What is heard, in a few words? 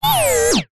robot servo motor mechanical whirr fast movement; science fiction